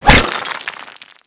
impact vase; weapons; Modern Warfare

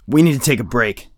tired; Escape from Tarkov

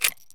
ammo unload; Escape from Tarkov; ambush

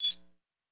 show map

